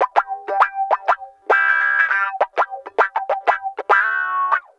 Guitar Loop; Ambient Grooves

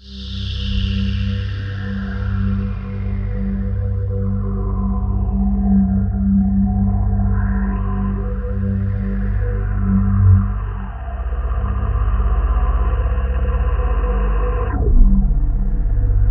POLY SAW, Roland JV 2080, C1

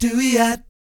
DOOEEOT, Vocal Planet Jazz & FX; ILIO

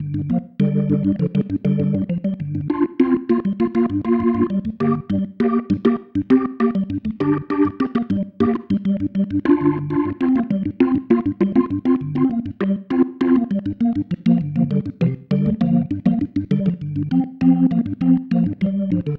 Pattern, Organ

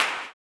CLAP, Blues Soul; Spectrasonics Vocal Planet

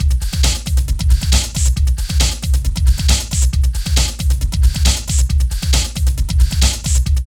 LOOP, Tekno Industrial; East West

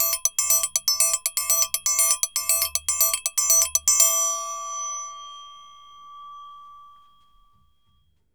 Triangle Samba 120 bpm; Best Service; Festa Latina VOL-1

